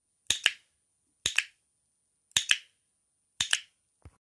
dog clicker; کلیکر سگ

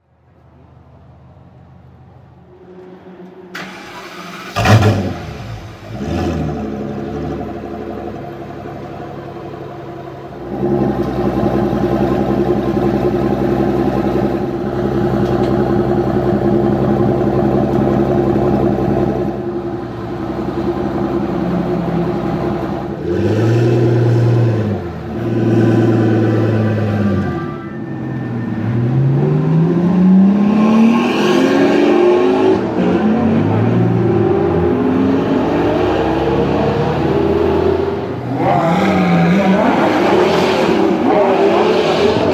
pagani huayra; اگزوز

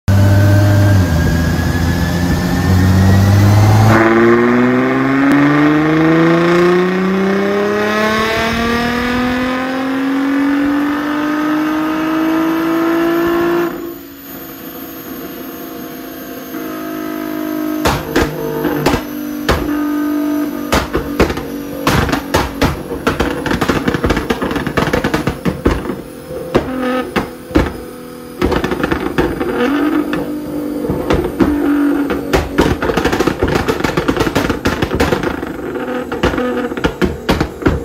nissan gt r

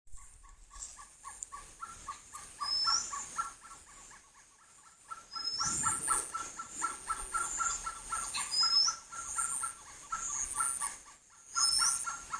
malabar pied hornbill

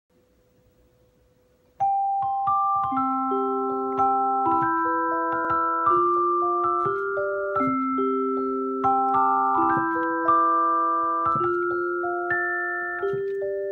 Celesta

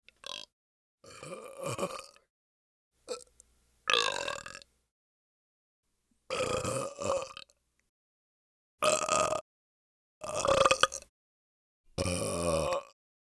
burps

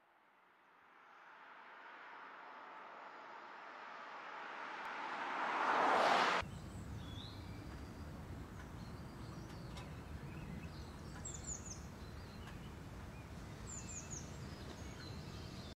Borgward

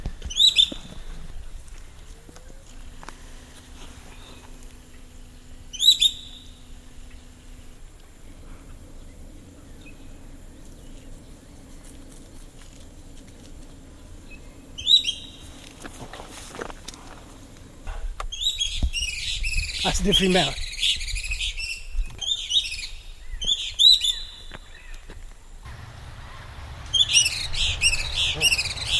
Giant kingbird

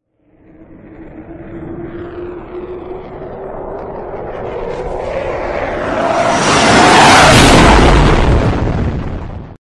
Jet Fighter Fly

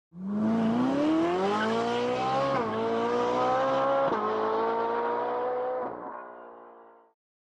Car racing

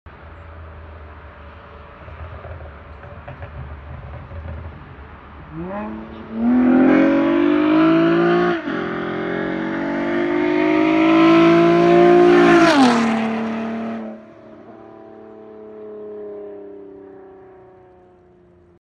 acura, ماشین آکورا

